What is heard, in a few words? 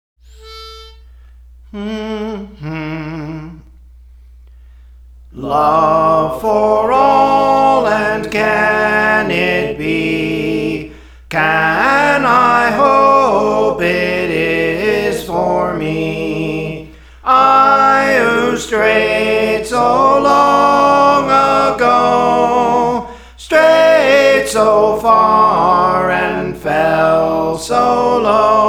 Soprano, Songs; Praise For The Lord Training